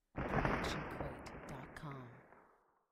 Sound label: Explosion Blast with Debris; SoundsCrate